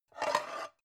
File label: pan take slide slow; objekte; topf